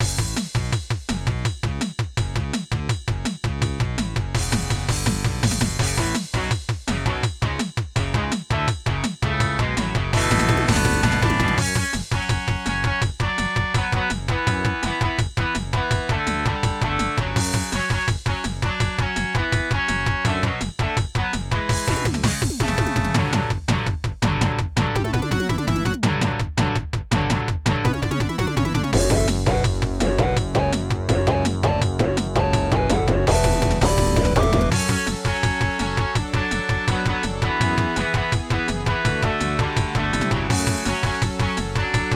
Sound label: Sound Saurus; Duke Nukem 3D Intro